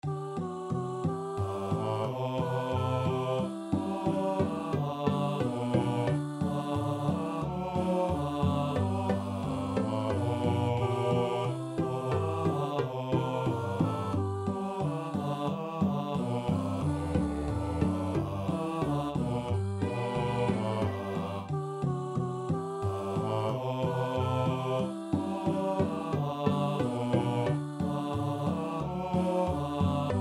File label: Chorus Men